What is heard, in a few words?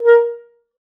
Clarinet